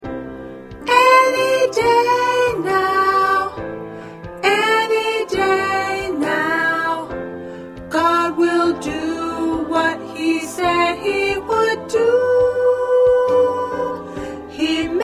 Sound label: Alto